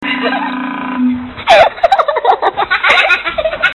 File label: laugh